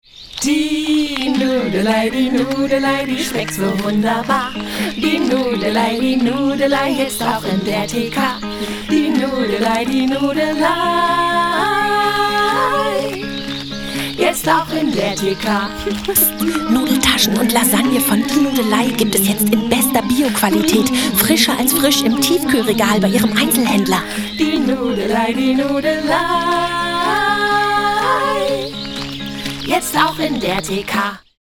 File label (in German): Nudel Jingle 3 Stimmen mit Ansage heimlich